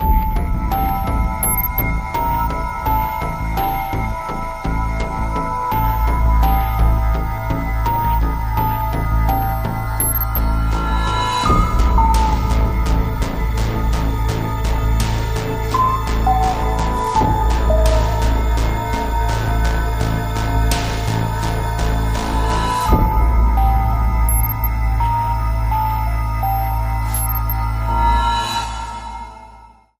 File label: UFO Pulse